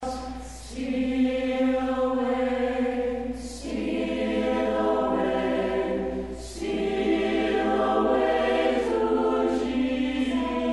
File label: Steal Away Opening SATB